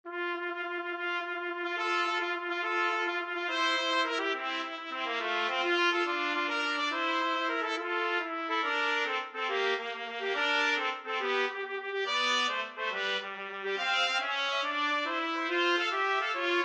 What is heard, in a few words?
trumpet duet